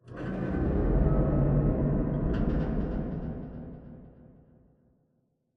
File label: Robot Arm Turn 90 Dist; Boomtown; Titanfall 2